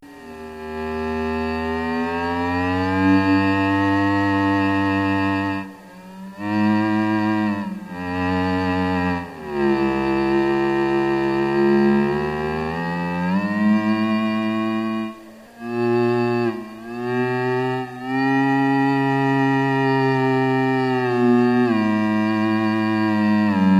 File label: theremin